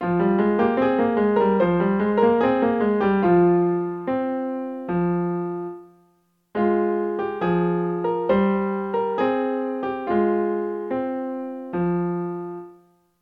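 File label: practice dotted 8th note in Fm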